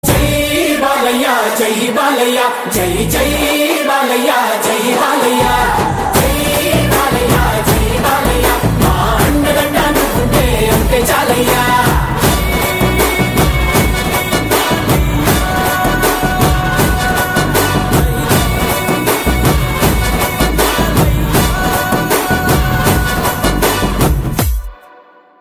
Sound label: Mass Anthem Theme BGM Ringtone